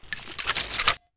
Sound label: saw belt in, weapons; svencoop